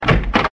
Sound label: door; smbx38a; The Great SMBX 38A Contest I; Blossom Whisper